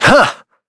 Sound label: Vox Human Jump; Shakmeh; kingsraid